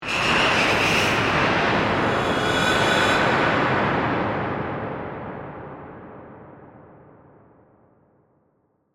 Ghostly Movement, Horror, Ghostly, Movement, Haunting; Ghosts